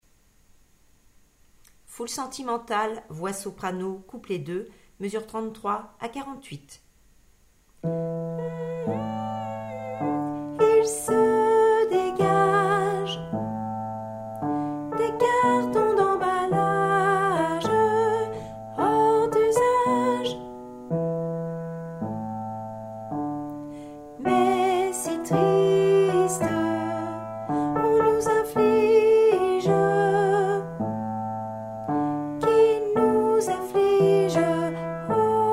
soprano

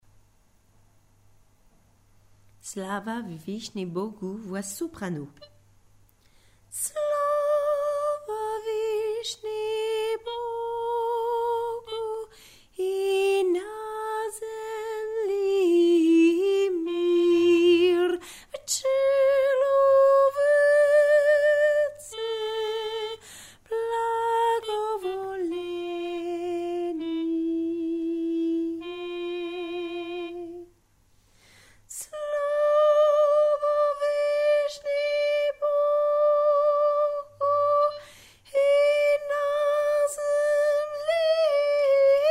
soprano

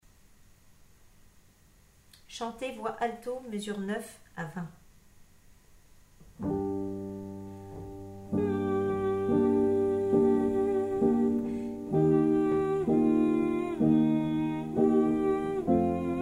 chanter alto

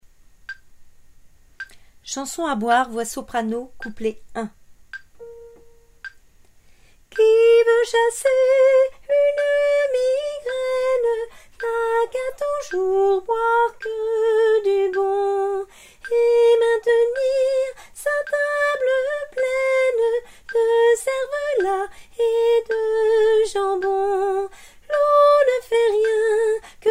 chanson a boire soprano

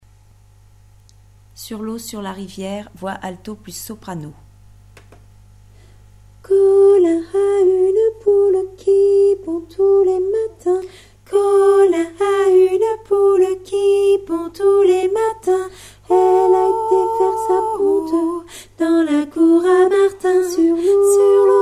alto soprano

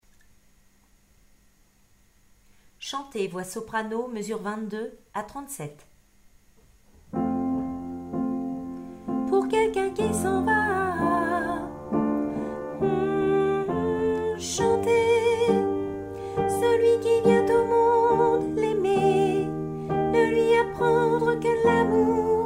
chanter soprano

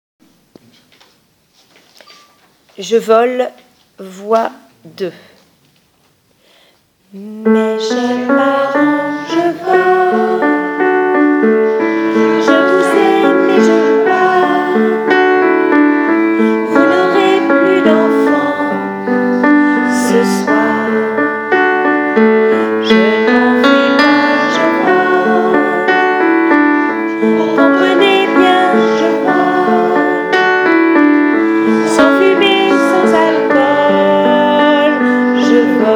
je vole voix

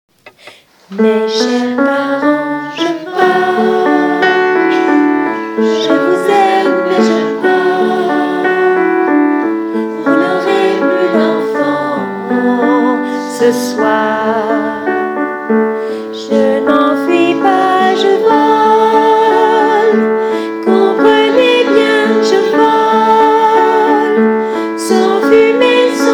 je vole voix

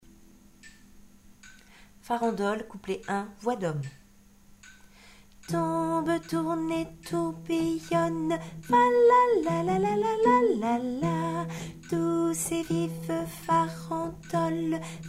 farandole hommes

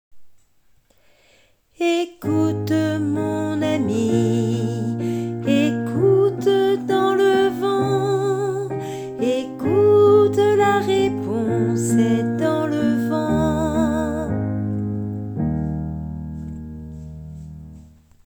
basse

